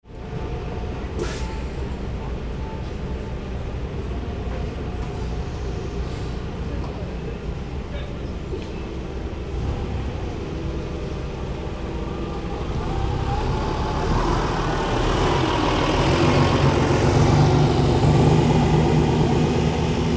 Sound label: traffic with bus